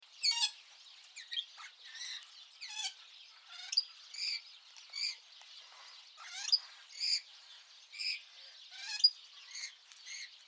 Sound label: Budgie sounds